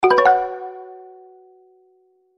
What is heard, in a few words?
get good item